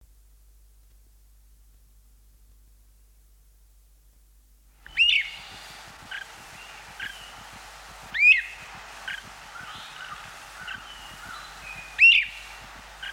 great crested flycatcher, songbirds

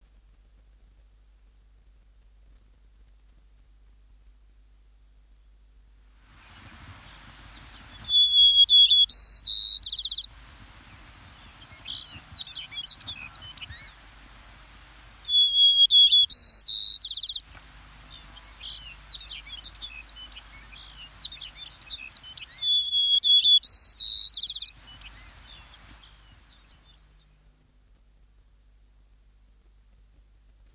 white crowned sparrow, songbirds

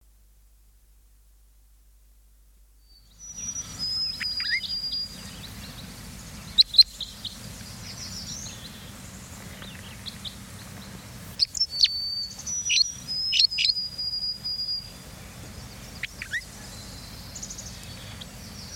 red crossbill, songbirds

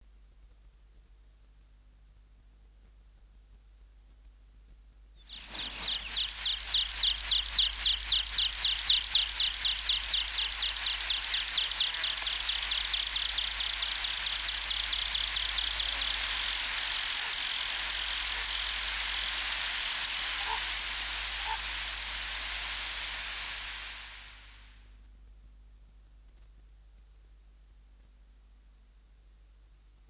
water pipit, songbirds

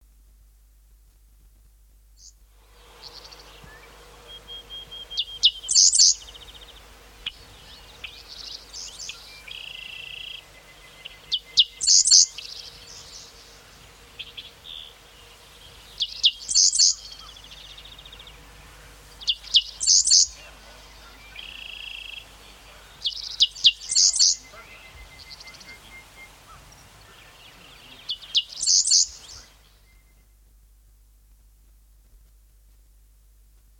dickcissel

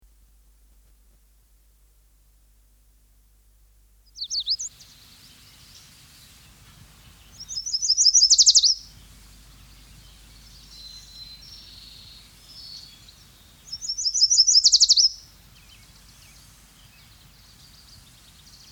yellow warbler, songbirds

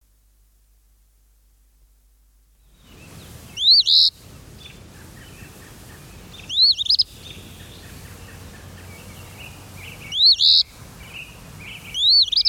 eastern phoebe, songbirds

